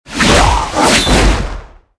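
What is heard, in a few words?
attack, monster; mutroop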